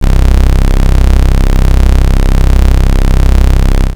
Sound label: Anasound Long mid C, Analog Single Notes